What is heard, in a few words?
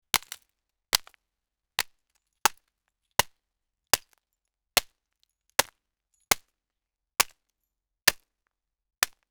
sharp light impact sounds, fragments